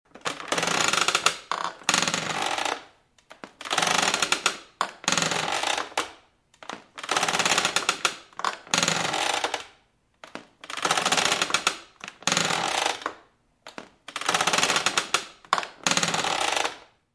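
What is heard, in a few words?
rocking chair slow heavy creaks on wooden floor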